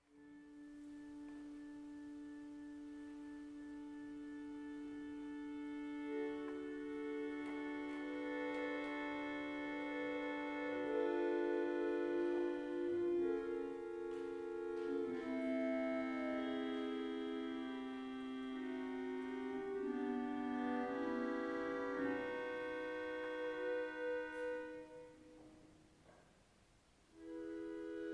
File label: Harmonium